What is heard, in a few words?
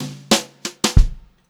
jazzy fill, Brushed Drum Loops; USB Soundscan vol.39; AKAI; 90 s Sample CDs